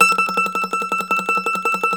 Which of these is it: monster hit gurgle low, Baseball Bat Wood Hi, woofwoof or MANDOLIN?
MANDOLIN